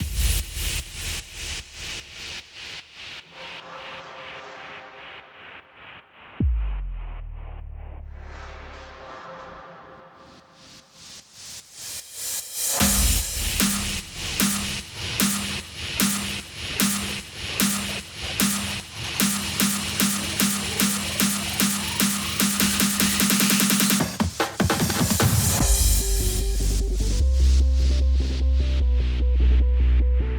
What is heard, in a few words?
Hardstyle